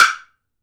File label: woodblock; AKAI S-Series CD-ROM Sound Library VOL-5